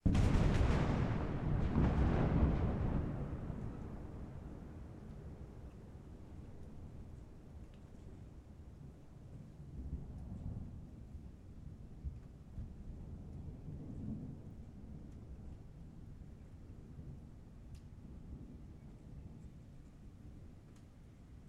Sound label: Lightning Impact